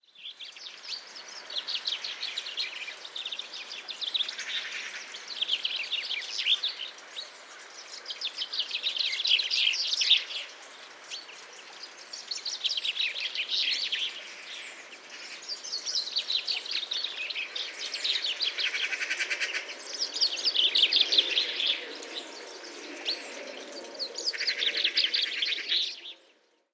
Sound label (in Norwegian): Bokfink øver seg til vårspillet